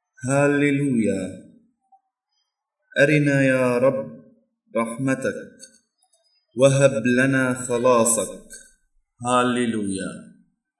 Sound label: Gospel Antiphon; Sunday Advent